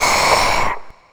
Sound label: Soldier Twitch